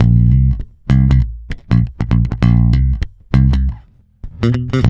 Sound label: C, mute, bass; Spectrasonics; Bass Legends; DEF MUTE